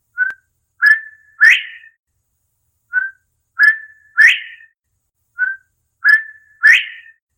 quail ringtone, Northern Bobwhite

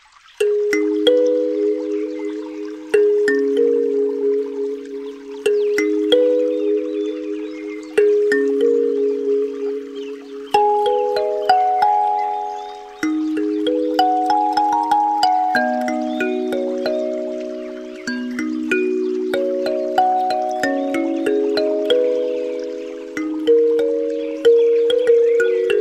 pipa relaxing music for sleeping peaceful chinese; pipa language

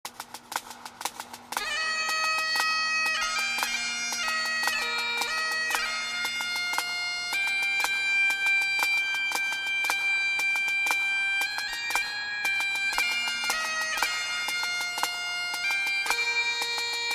Ringtone

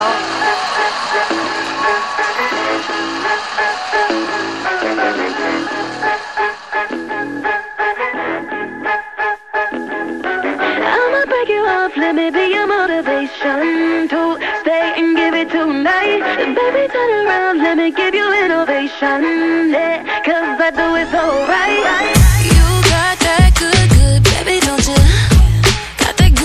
Instrumental

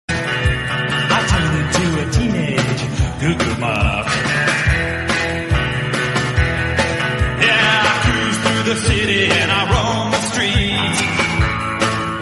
Ringtone

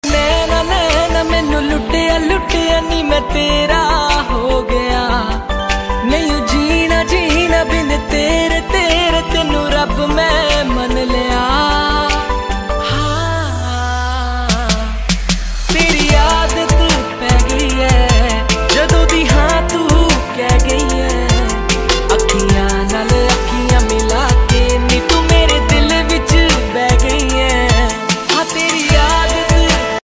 whatsapp status tune

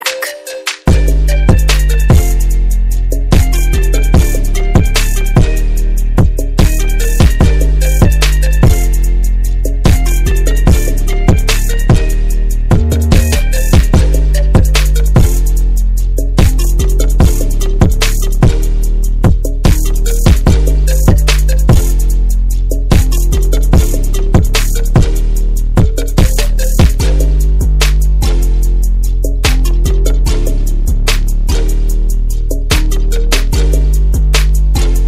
Bamboo Melodic Trap Beat Instrumental Bass

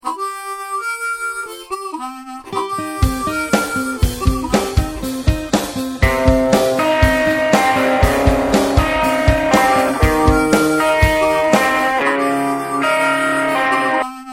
blues; garageband

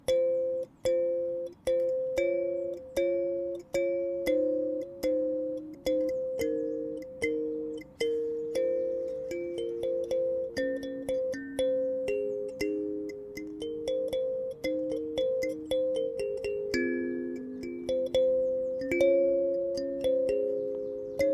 kalimba cover

